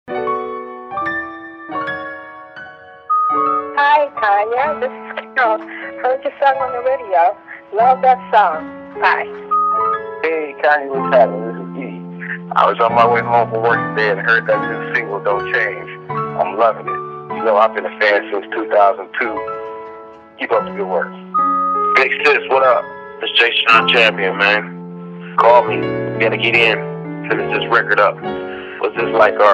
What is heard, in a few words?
piano interlude